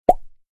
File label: new message